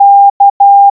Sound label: oubun k, morse